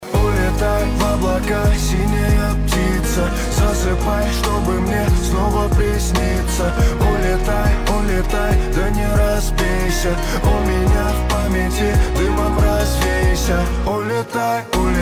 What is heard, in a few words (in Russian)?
рингтон